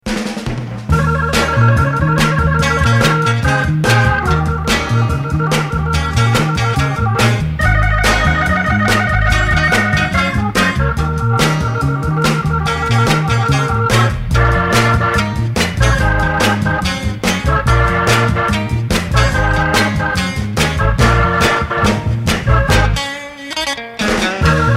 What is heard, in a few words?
Third Organ; Carnival Records